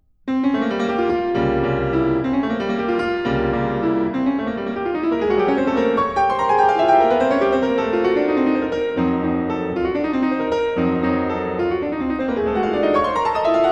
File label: Préludes, in F Minor; Chopin Preludes